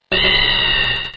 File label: scream